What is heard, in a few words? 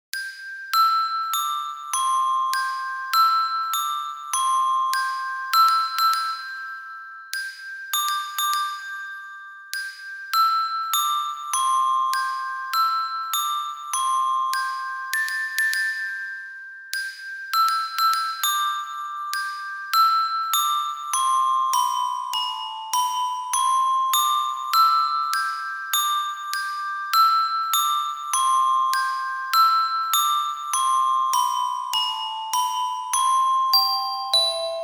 Glockenspiel